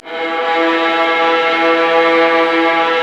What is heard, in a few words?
STR, Vas Sul Pont; STR Vlas Bow FX